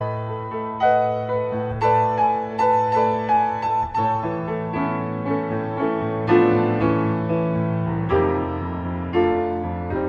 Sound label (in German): Ueberleitende Improvisation am Fluegel